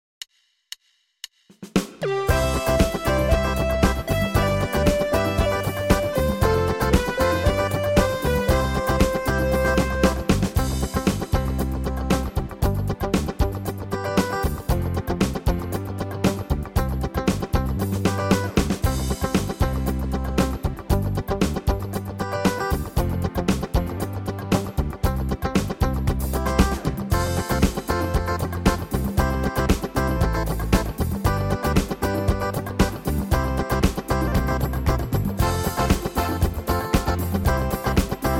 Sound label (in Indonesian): Instrumen